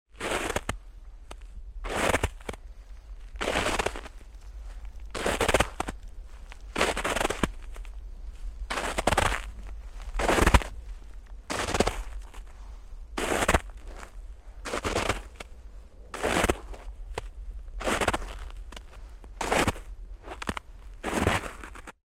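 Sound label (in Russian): впереди или позади идущий по мокрому снегу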